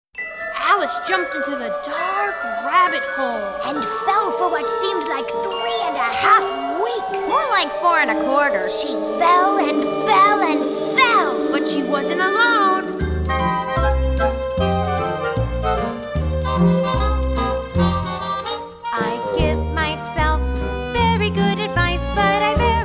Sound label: Guided Vocal